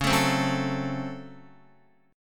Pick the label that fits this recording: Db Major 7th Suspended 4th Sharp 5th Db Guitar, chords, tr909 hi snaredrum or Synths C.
Db Major 7th Suspended 4th Sharp 5th Db Guitar